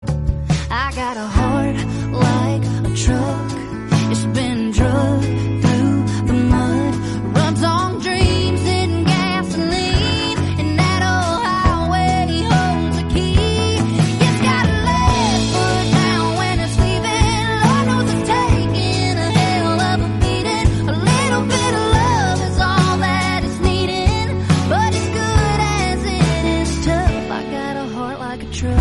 English Song

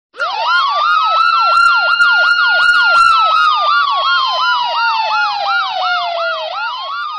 Ambulance siren sound

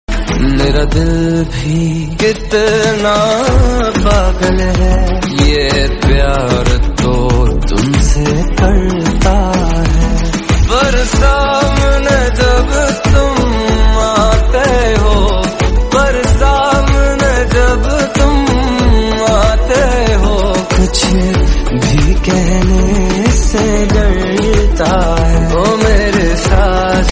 Song Hindi

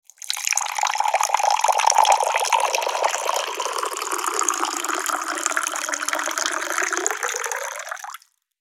Glass Water Jug

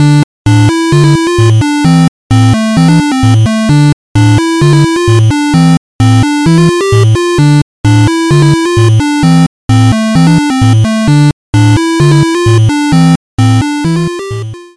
Retro Game 8 bit sound effect

